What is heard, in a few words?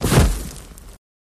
Horse Hoof Hvy Fallin